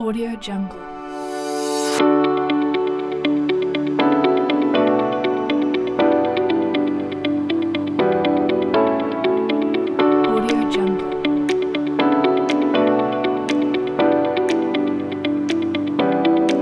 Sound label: corporate upbeat feeling; onrainymonday